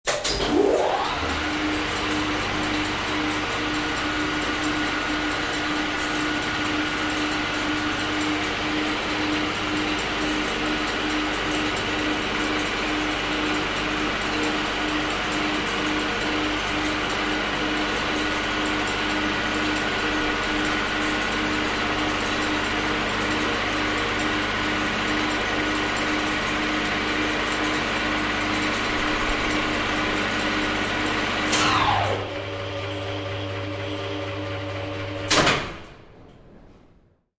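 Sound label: Elevator Engine Start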